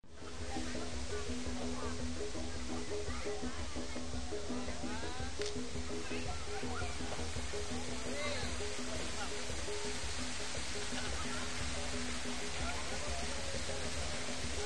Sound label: drum falls